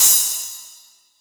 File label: kit, Yamaha Motif-ES8